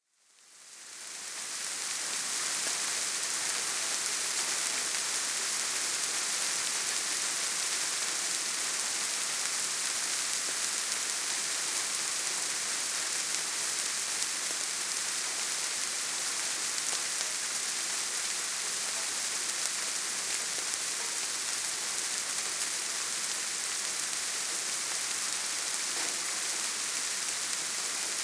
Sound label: calm rain ambience